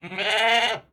山羊冲撞前声

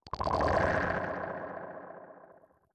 潮涌核心攻击声

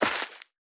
缠根泥土踩踏声

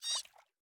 美西螈空中待机声

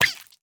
美西螈受伤声

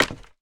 脚手架踩踏声

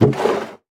木桶打开声

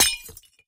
玻璃破碎声

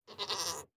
山羊待机声

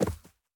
犰狳展开结束声

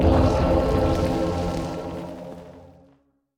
唤魔者准备召唤声

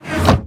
末影箱关闭声

